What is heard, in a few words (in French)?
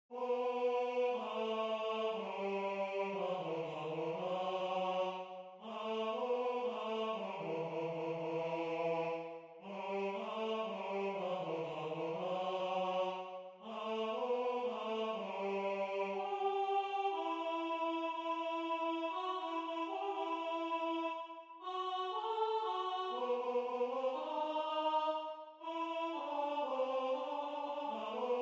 les sans papiers, 2eme voix